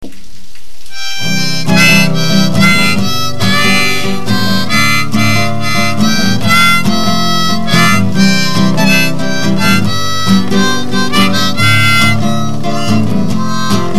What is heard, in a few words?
folk rain song